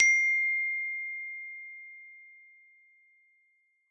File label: note, Alerts